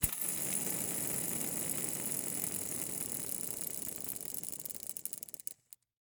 Rollerskates, old fashioned, all metal, wooden wheels, swipe, spinning, slow to stop; 2 mics; focus wide; Eiravaein Works; Vaeyan IV